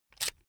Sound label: electric drill template adjust heavy out; soundpool